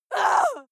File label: female lunatic shout short; schreie; menschen